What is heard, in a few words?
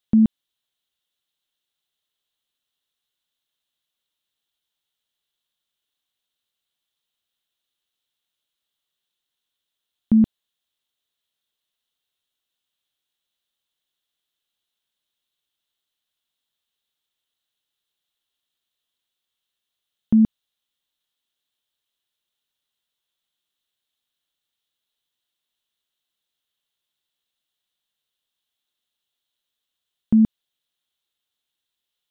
silence beep; cisco; cucm